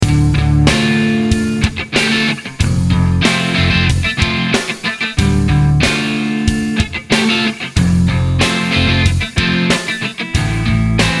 Bugera 6260 Clean

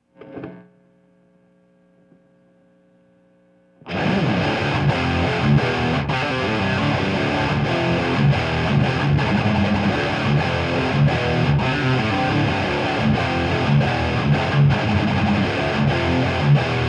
Peavey 6505

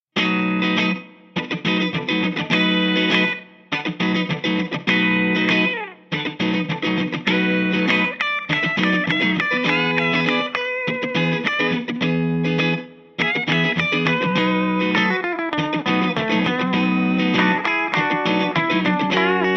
Epiphone Valve Junior Clean

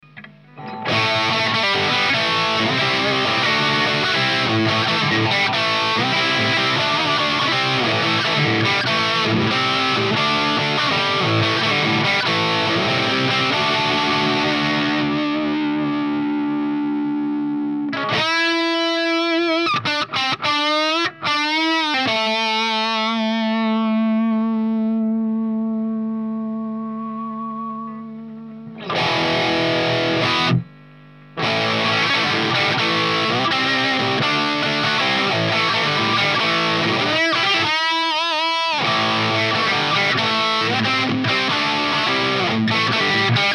Laney GH50L

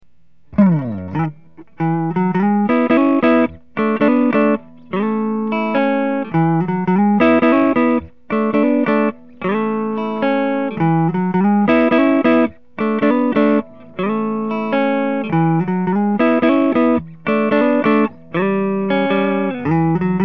Blackheart Little Giant Clean

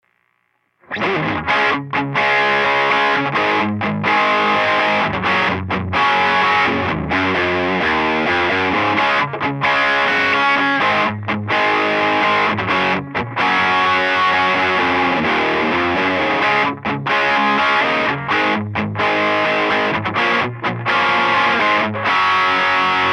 Budda Superdrive 30 gain

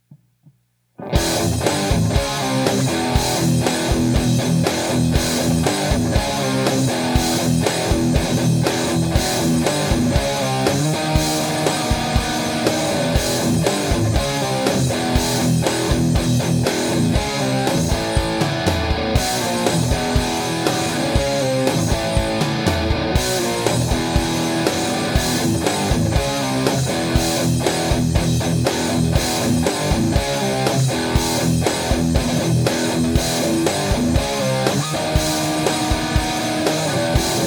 JCM 800 Gain

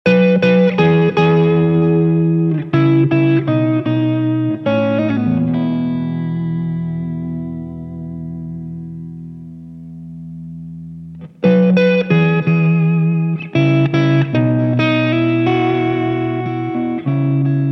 bogner shiva clean

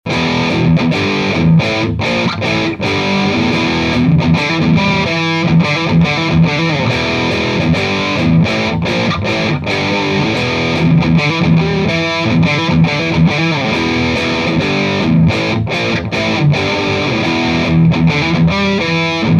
Marshall 4100 Gain

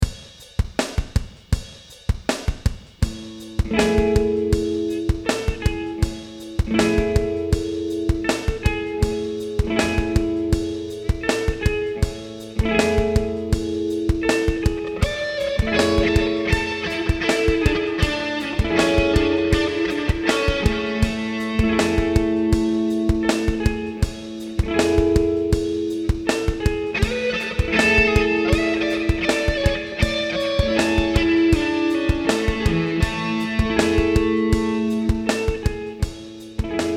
Egnator Rebel 20 Clean

